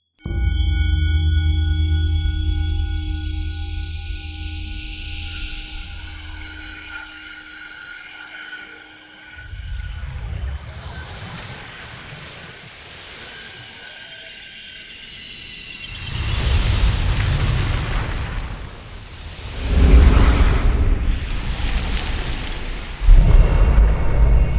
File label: Magik; mec magic lowes crafts